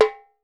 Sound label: METAL TAM, Percussion; Voice Crystal - Steve Reid Definitive Percussion